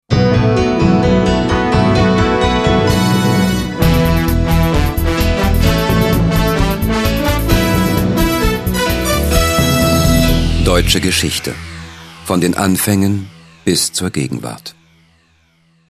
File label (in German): Jingle; DeutscheGeschichte